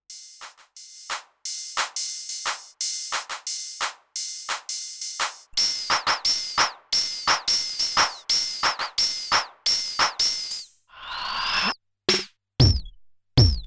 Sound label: dance beat, freqbox moogerfooger; Batteria piatti – Roland Handsonic; VCO: 0 SYNC: no WAVE:tri ENV AMT: 0 FM AMT: 0 MIX 4; MF-107